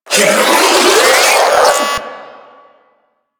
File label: Proto Infested Ancient Mid Spin Scream; Enemies; Abilities; Warframe